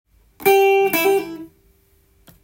blues, guitar; blues short phrases

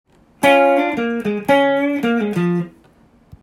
minor major penta, guitar

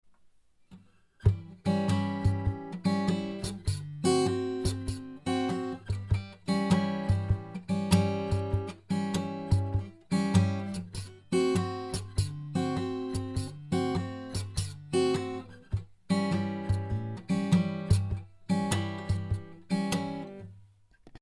blues

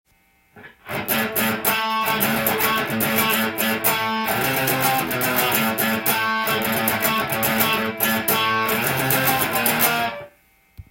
riff, guitar; how to create guitar riffs; musictheory

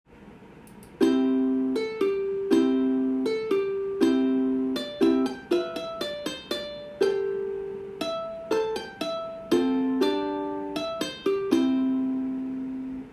ukulele

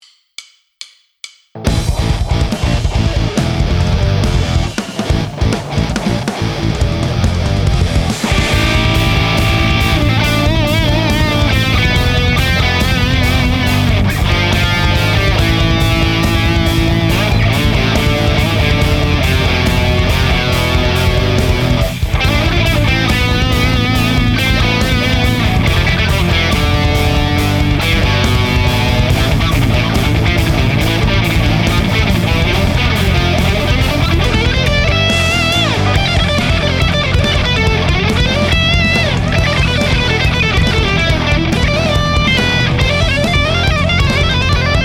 metal guitar solo

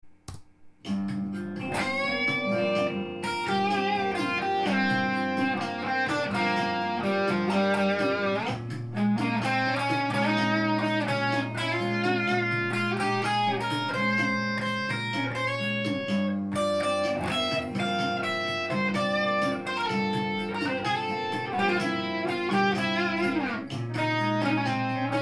gm7 solo

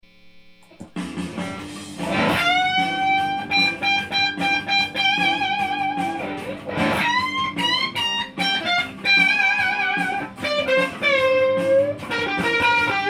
rocknroll solo

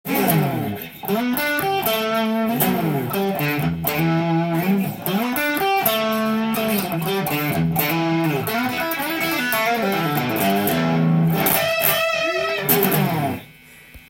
fender usa 61

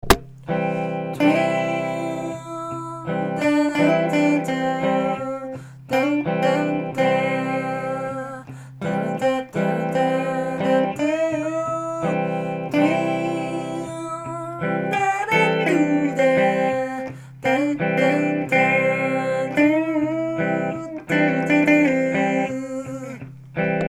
guitar

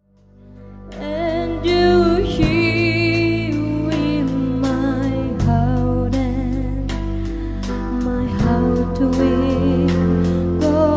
guitar

